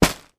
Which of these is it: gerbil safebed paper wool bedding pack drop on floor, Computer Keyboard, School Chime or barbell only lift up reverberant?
gerbil safebed paper wool bedding pack drop on floor